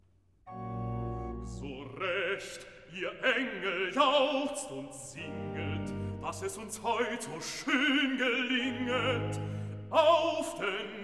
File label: Recitative; So recht ihr Engel jauchzt und singet; BACH; Christmas Oratorio